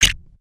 Brick Click

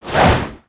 Massive Spear Swing

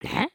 Idle Look Up; Lego Strombie

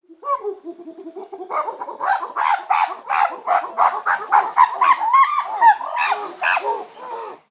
Jungle Primates; gnarled forest

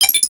cpu interact

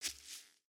Foot Grass Walk, footsteps

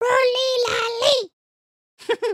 train sit, emotes

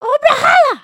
come here, emotes

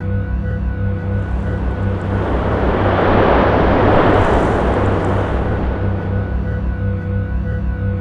Race Snow Swirl

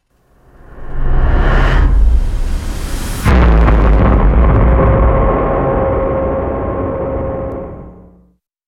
dark reveal scene trans white

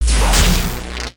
dummy spawn

